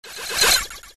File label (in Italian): suoneria botton; beep